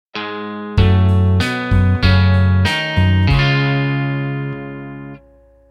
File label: IV m melodic